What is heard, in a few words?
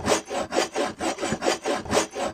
saw; 30 cc0 sfx loops